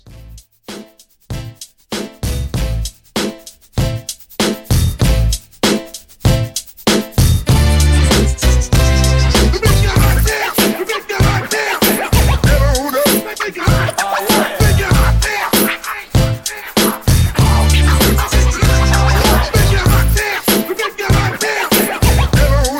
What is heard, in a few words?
Hype Scratch